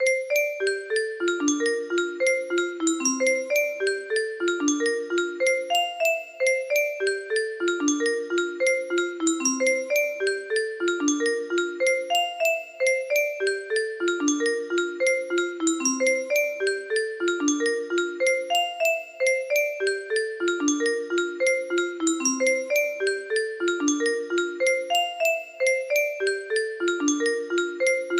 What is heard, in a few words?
piano